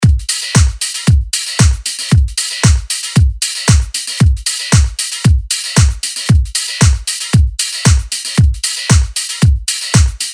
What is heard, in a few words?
DRUM LOOP HOUSE 115BPM